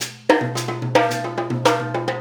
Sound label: Trap Kit, Drums; Sonic Foundry (Sony Creative Software) - Essential Percussion